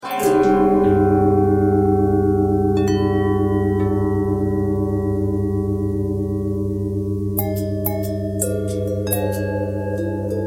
mixed twist all, bells

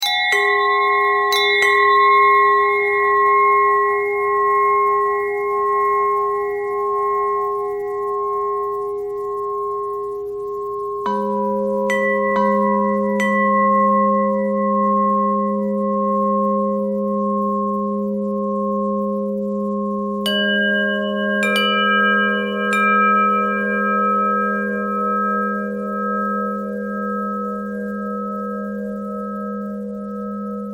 bell bell thread pentatonic, bells

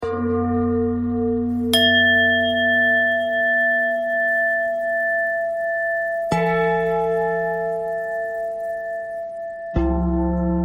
bell bend threads

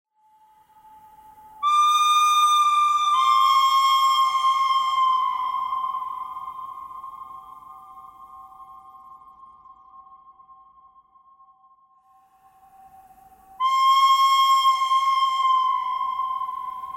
bird cry bird cry thread harmonic; birds

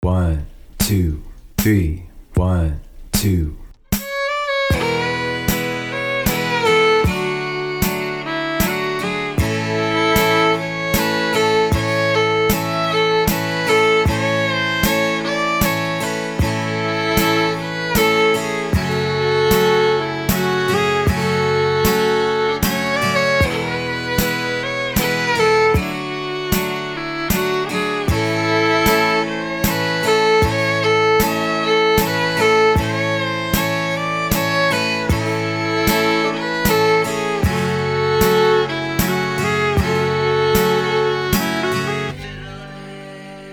blackest crow variation, fiddle

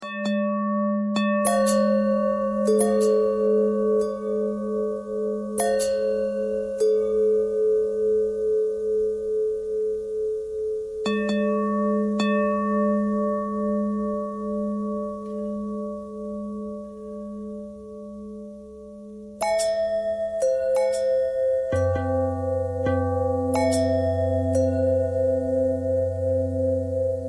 long bell bell thread pentatonic, bells

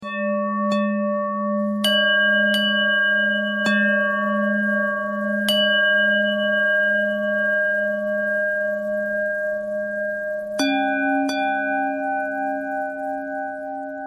bell thread bend thread

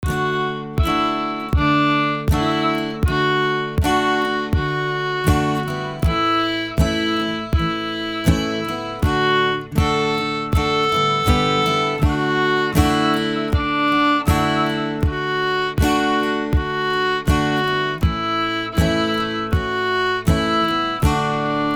mary full tune sing and play, fiddle

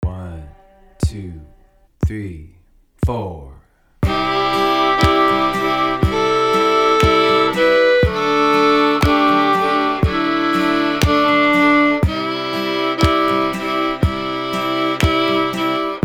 Kerry Polka backup chords A2

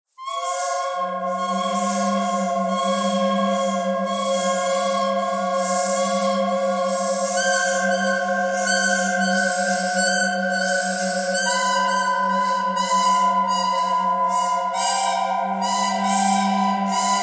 bird canyon thread buzz

